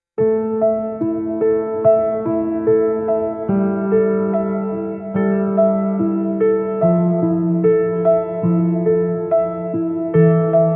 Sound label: ai piano